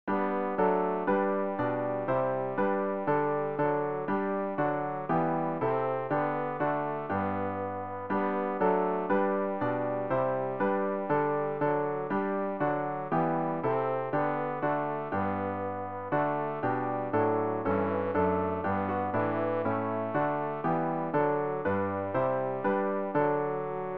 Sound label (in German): tenor; Ref. Gesangbuch; RG 693: Bei dir, Jesu, will ich bleiben